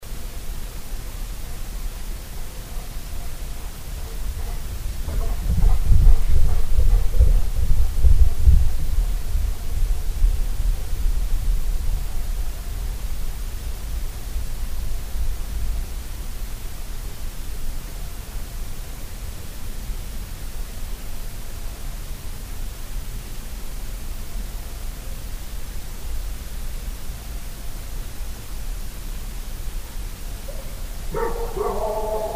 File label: Running Across Bridge; bigfoot